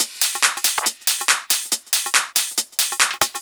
drum Loop 140BPM Full; Multi Loops

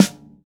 WD Snare, Roland BK-5; Snares

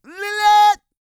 SING, World Voices, CARIBBEAN

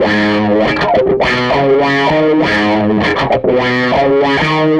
Tele Guitar Loop; Sonic Foundry (Sony Creative Software); Six-String Orchestra

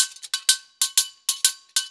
Blues Perc Loop; Drum Tools

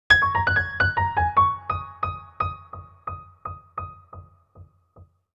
Piano Solo, Cinematic Funk; Cinematic Funk Urban Tone Poems

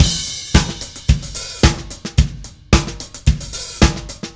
Drums Crash, Indie Rock; Session 01 - Brighton; Sonic Foundry (Sony Creative Software); Indie Rock Anthems

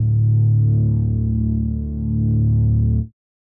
Reese D; 99Sounds; Monosounds; Music Loops; Instrument Oneshots

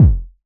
KCK, Roland SH-09 synth drums

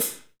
HH, Kits, Rev

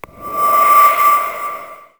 Kick Rev, Drum Machines

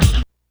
kick; Big Fish Audio; Hardcore Breakbeat Action; Funk Factory

